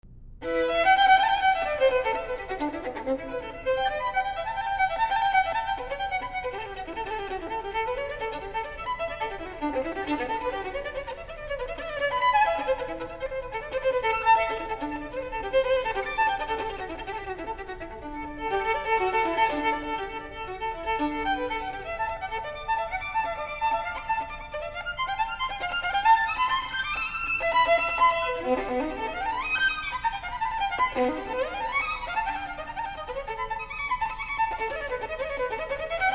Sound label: violin